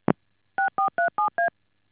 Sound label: DTMF